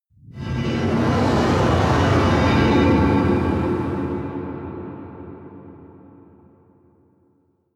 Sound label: whoosh scifi wind